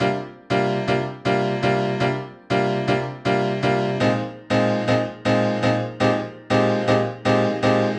Chord Piano Cm, 120bpm; Zero-G; Extra Chord Progs

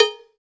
Cowbell, Roland BK-5 Percussion

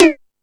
Hip House, drum machine; E-MU Pro-Cussion-1

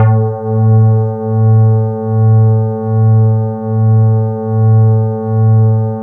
E-HARP, DX HARP; Wizoo - Powered DX

